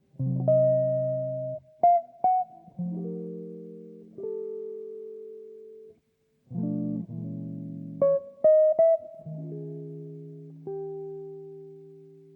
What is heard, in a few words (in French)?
Chanson